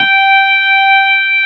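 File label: Wurlitzer G4 - R; USB Soundscan vol.09; AKAI; Keyboards Old School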